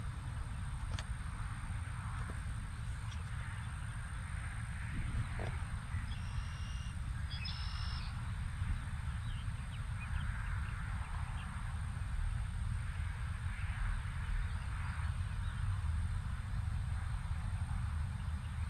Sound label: woodpeckers